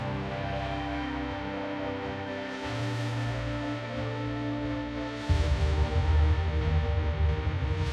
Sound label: epic sting; sound effects